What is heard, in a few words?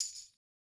Tambourine Hit; Hip Hop